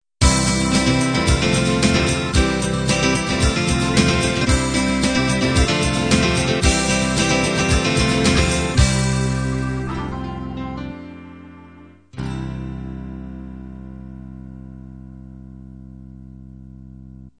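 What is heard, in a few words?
pop 16 beat end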